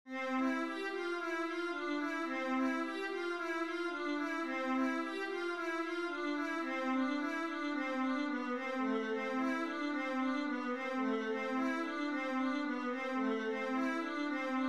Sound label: vla etude, viola